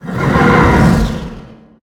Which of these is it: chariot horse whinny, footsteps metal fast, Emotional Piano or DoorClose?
chariot horse whinny